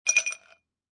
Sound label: Ice Cubes Drop In Glass Cup Single, Foley, Ice Cubes Being Dropped Into A Glass Cup, A Single Handful Of Ice Cubes